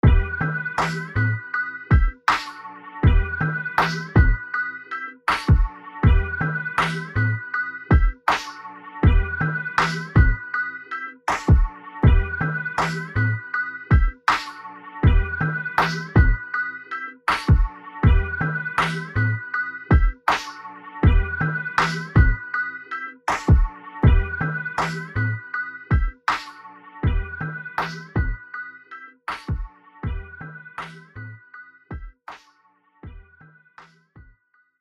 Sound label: Grime Loop; 8d sample pack genres